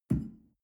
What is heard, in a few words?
shell grab from tablecloth medium; soundpool